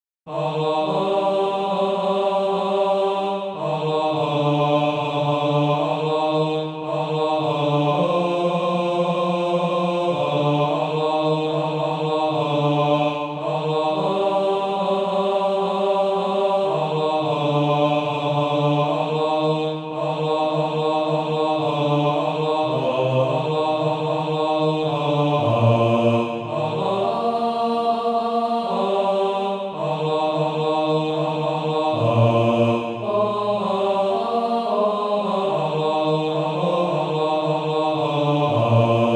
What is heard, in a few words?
Male Choir 2B; A Child of A King